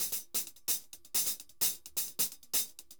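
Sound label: hi-hat, MERENGUE; Best Service ProSamples vol.14; AKAI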